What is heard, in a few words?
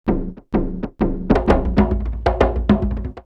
Lusaka 128, drumloops World Ethnic; X-Static Goldmine